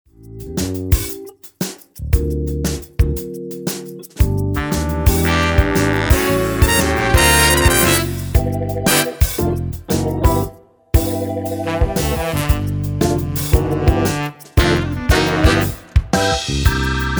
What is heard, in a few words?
brass a part